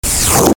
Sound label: agressive swoshes high short